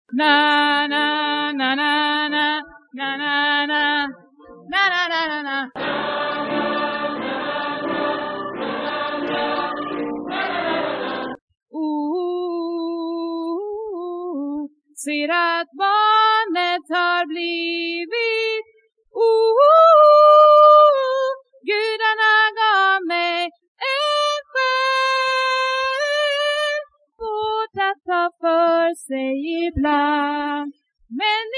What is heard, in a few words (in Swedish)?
sopran